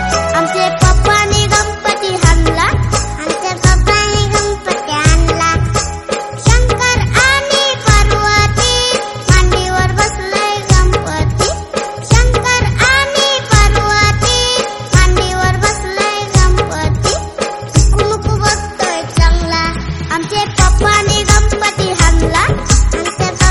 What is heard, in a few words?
Marathi Bhakti Ringtone; Ganpati